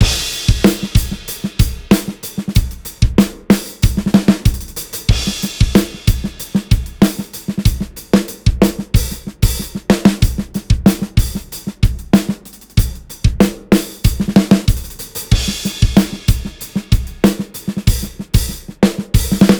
live drums; df xcite; drumforge

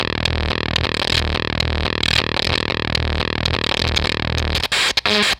Fuzztest drum fuzzfactory

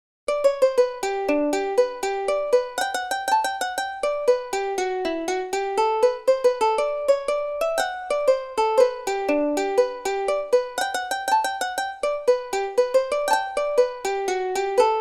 aloha ukule; aloha ukulele; tiki instruments; chiqui audio

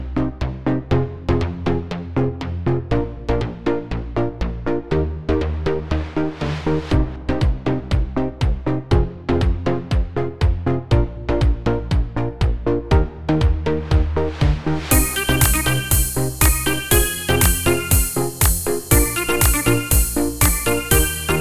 tropical house; klamper audio

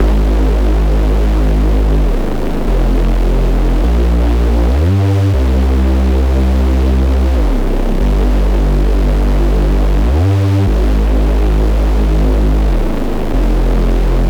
reese dry; wavefield

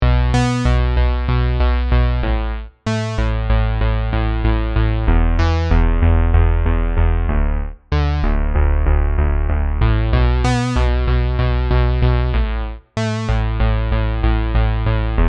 funk bass

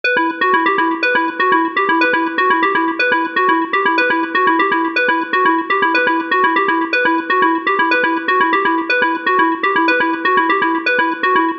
pluck

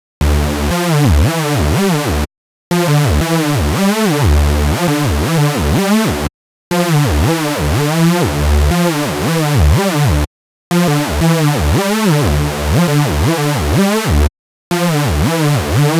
saw synth

